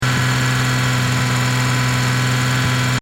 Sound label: Engine: Medium Revs Loop, car; Cars; Vehicles; Stuart Duffield